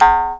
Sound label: E JAW, Primitive Instruments